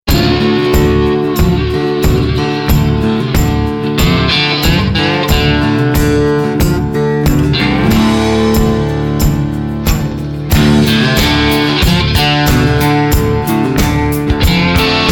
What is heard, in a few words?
stereo mix no EQ; fab q2